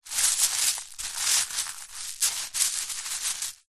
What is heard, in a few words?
foley footsteps barefoot scuffs movements small stones shingle